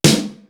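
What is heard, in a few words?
SMASH SNARE; East West; Global Sounds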